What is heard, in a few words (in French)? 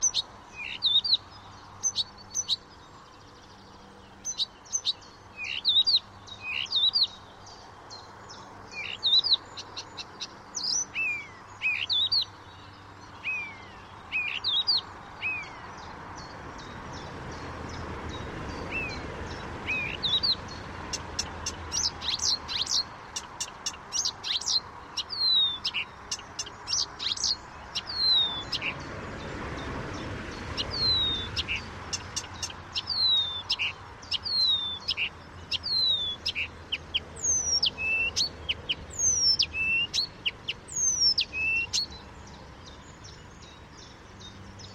rousserolle des buissons; chant